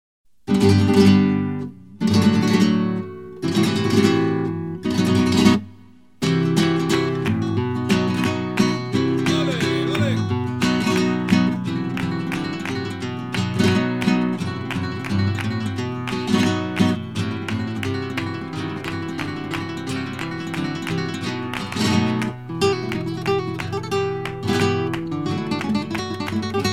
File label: Tangos